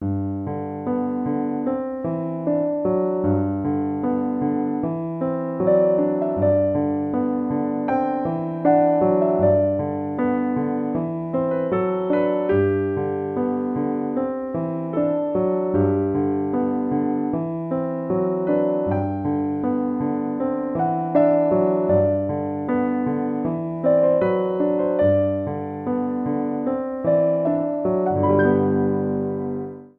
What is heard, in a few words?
Soft Touch (solo piano)